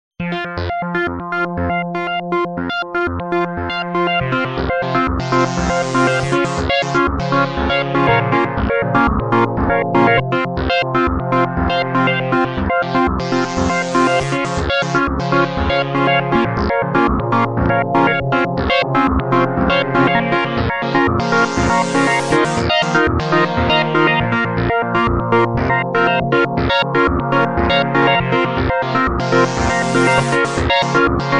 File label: synth; LoopMangler